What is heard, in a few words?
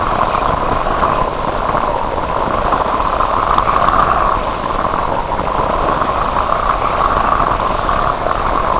Fumerol Loop